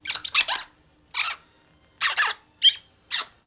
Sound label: squeak